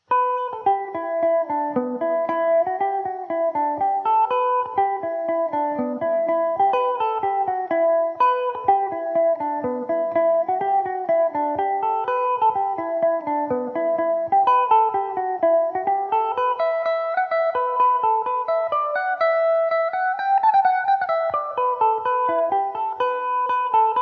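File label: E dorian, Irish mandolin; green cottage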